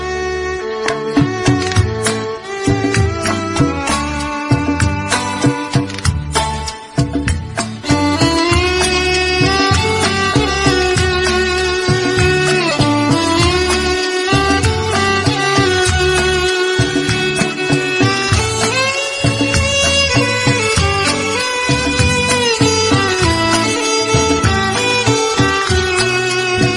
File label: Instrumental